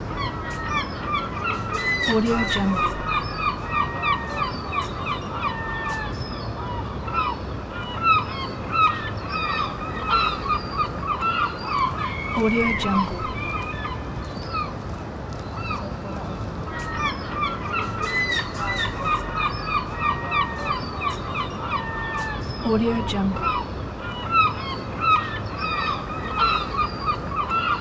beach ambience with seagulls

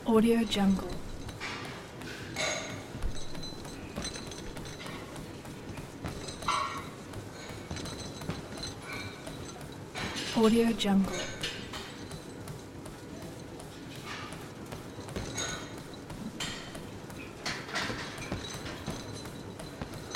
gym fitness studio ambience

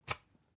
object electronics usb port metallic out hard

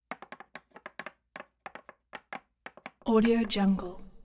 zebra hooves tapping three inch film cores

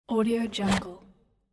board game box lid open

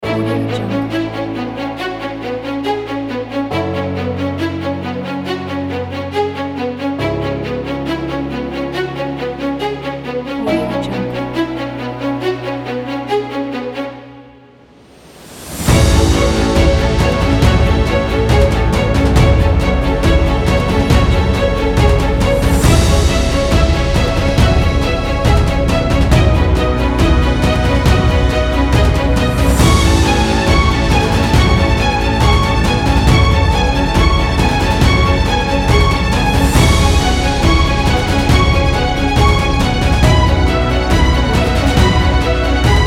action and heroic; synergymusicua

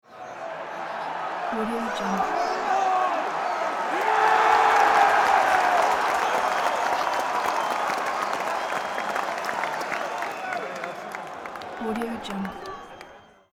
sports crowd shouting cheering celebrating

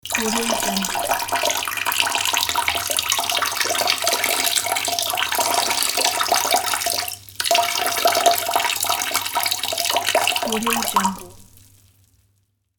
peeing in a toilet

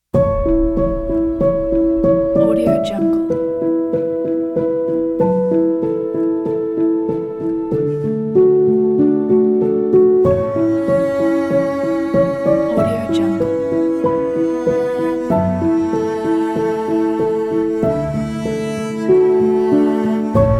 emotional melody of love

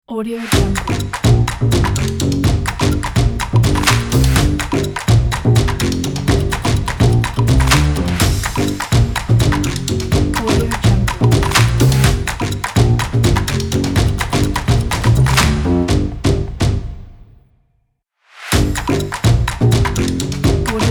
claps bass drums spy logo

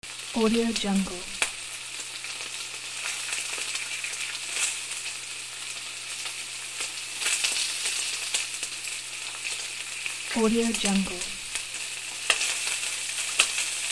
frying on fire

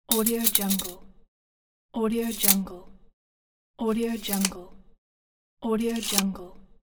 measuring tape sounds

